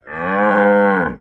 Cattle, Cow Moo, Bellow. Single Low Moo Bellow. Close Perspective.